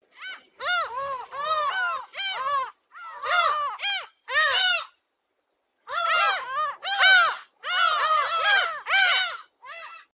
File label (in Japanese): umineko, bird